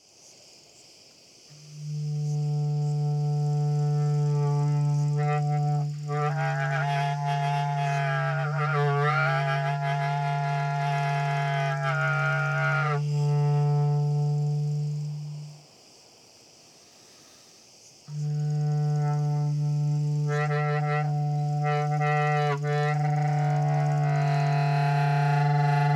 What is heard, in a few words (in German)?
Rau Ton Spiel